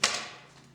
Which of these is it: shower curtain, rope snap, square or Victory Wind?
rope snap